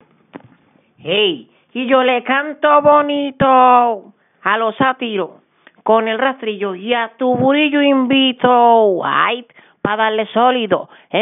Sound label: spanish singer